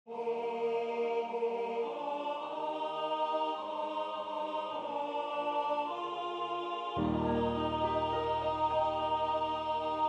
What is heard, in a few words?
alto